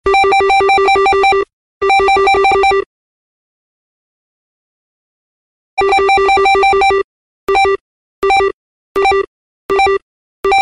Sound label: Ring Ring